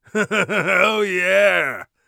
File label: joyous, Warrior Voice; Warrior Voice Pack